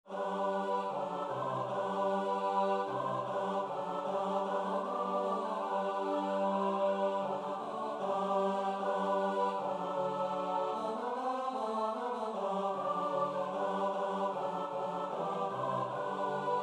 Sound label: satb; Shepherds Arise